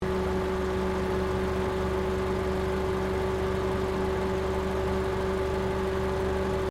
CAR SUBARU LIBERTY EXTERIOR IDLE RADIATOR FAN LOOP; Subaru Liberty 20 01; Family members Subaru; Vehicles